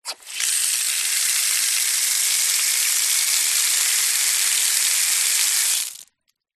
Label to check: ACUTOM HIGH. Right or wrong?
Wrong